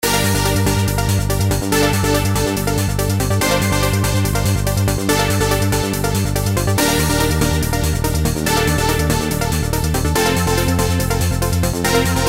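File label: arpeggio; emx1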